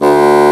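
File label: Contrabassoon mf D1; Digital Sound Factory Virtuoso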